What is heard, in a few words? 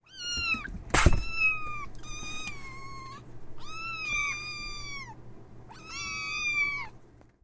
mew